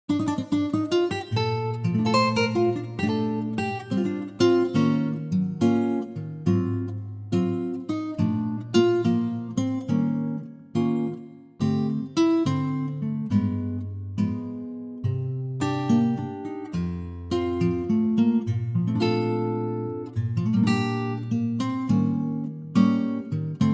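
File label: B flat acoustic guitar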